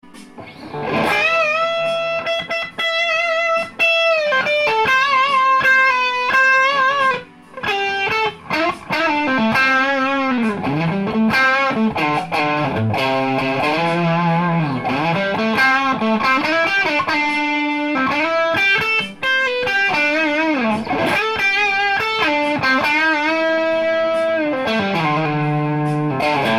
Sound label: mangasouko sound, guitar